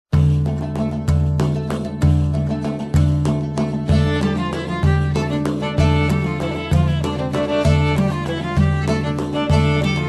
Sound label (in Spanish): Danza; basada en la Cantiga 100